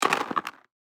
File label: DICE on Cardboard, Throw and Roll, Standard, 16 Sixteen Dice; Samples; ArticulatedSounds; DICE Sfx Party (Extended)